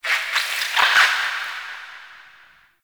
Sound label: Critter Squeak; Ambience; Warframe; Nokko Colony